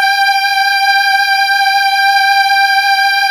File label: SPACE VOICE, PAD; S.PAD